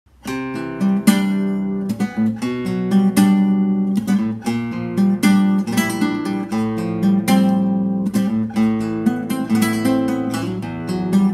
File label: guitar theme